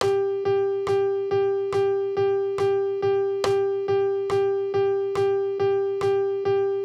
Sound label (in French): croche binaire